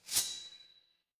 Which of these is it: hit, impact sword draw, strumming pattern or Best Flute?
impact sword draw